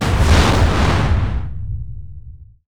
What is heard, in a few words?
nether blast; Garry's Mod; popp5 lily love